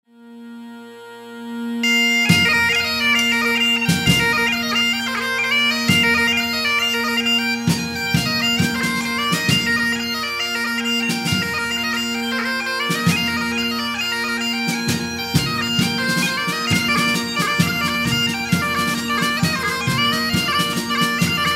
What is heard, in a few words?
Jigs, bagpipe